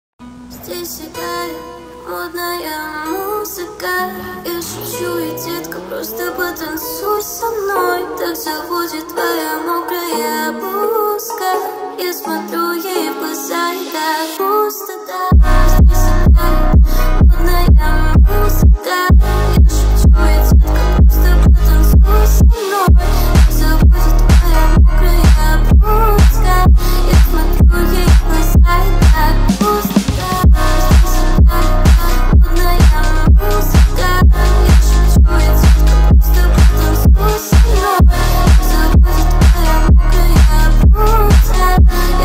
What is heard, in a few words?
Phonk Remix